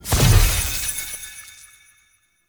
Team Buff Burst; Enemy; Sinecure; Melee Grunt Dual Agent; Abilities

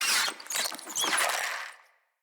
Infested Ashen Vocal Attack

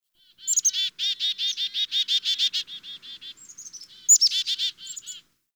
Bird Chickadee; Warframe; Ambience; Nineteen Ninety Nine

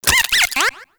Lure Move; Warframe; Teralyst; Vomvalyst Lure

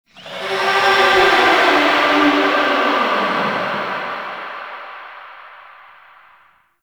Pain Verb; Enemies; Warframe; Tau; Sentient Dactolyst

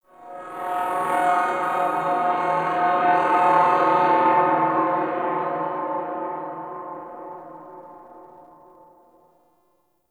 Distant Sentient Drone; Warframe; Tau

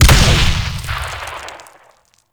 Artillery Explosion; Enemies; Abilities; Warframe; Teralyst

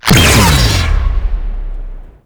Missile Ability, Motorcycle; Warframe; Motorcycle Ninety Nine

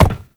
Step Glass, Movement; Uriel; Warframe

